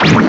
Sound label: cut; HeavenStudio; dogNinja; lowQ